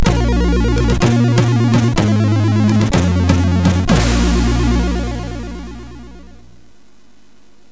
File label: ingame, MOS6581R4; sid; Flummis World